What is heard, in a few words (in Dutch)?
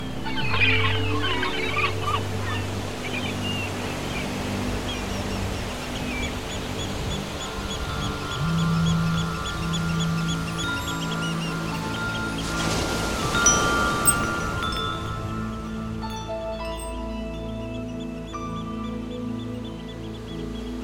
Aan zee Rustig